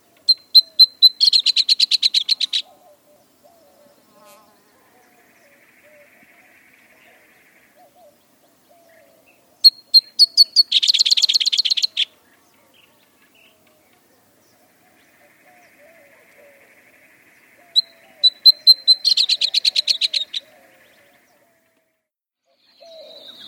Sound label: Aberts Towhee